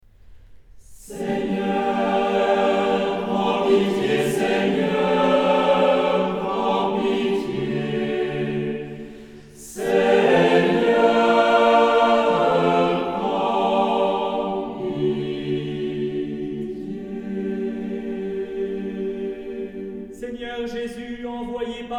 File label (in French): Carême Kyrié Polyphonie